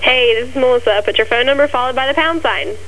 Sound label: pager